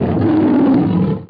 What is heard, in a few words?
roar